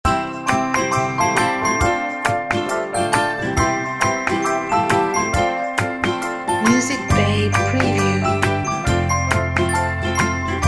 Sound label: Happy Day Logo